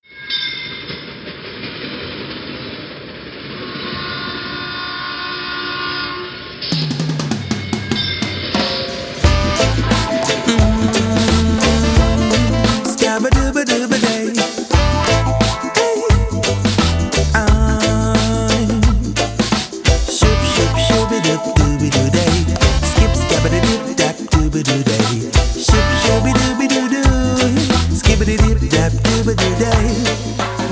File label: Reggae Soul